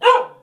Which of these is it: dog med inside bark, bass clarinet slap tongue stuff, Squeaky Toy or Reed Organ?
dog med inside bark